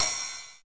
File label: TR-909 Ride; Roland BK-5